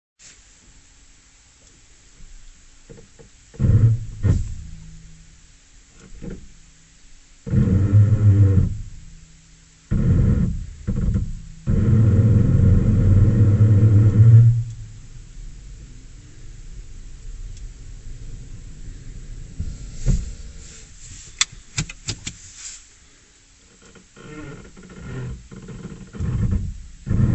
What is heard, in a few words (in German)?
Stand, Prius